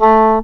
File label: Horns